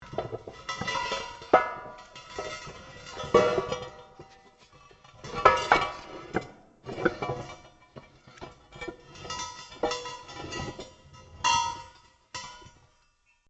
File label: METAL ON METAL LARGE MOVE; Materials